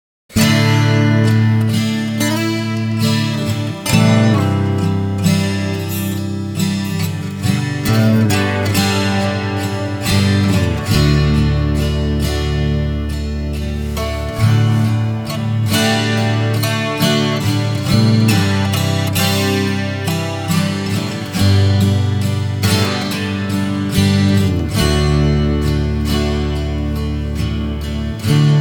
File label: 12 String Test